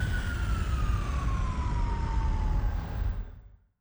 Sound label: engine stop, sci-fi sounds, mechanical